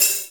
drum hit whistle; osu

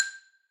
xylo

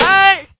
drum hit whistle; osu

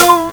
soft hit whistle; osu

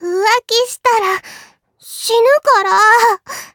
applause

